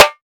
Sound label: Short Snare Drum D Key; snare drum samples